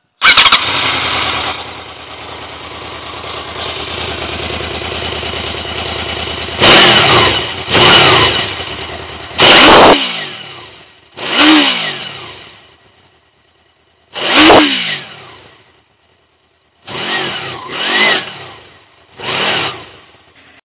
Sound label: sound honda crossrunner